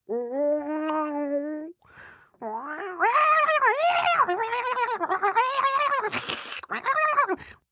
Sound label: Cat Fight, Cat fight sounds, (human voice); Cats; Animal; VoiceBox